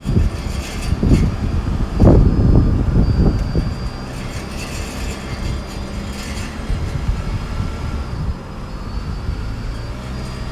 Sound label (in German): Auspuff lose